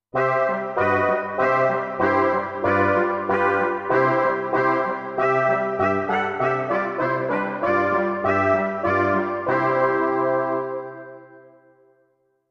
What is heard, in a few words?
Synth Aahs